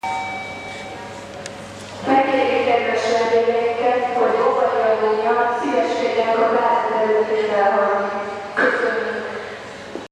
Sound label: bomb